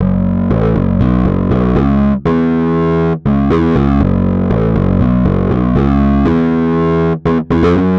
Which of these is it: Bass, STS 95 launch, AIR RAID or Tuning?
Bass